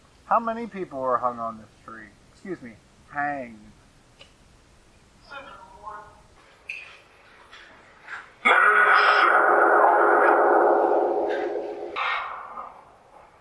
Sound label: Hanging Tree Phasma; blennerhassett island; 7 or more Murder Get away with it In different ways Different people; case study 4 investigations